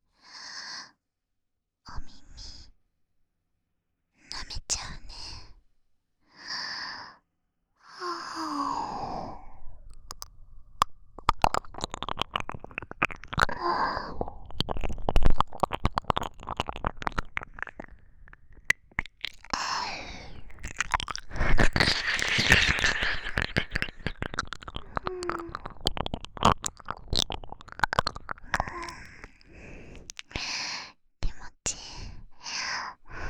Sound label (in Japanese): 耳舐め, ASMR; SEあり